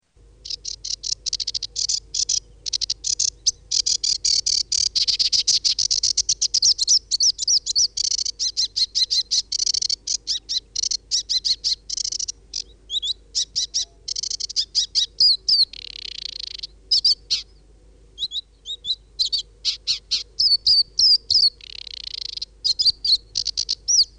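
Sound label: Aquatic warbler; Madarak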